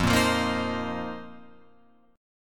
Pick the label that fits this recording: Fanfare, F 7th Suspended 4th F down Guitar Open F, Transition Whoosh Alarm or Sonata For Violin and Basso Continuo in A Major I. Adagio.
F 7th Suspended 4th F down Guitar Open F